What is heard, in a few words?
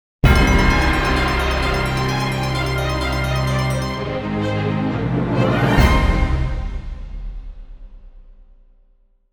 Bonus Decision Jingle; pachislot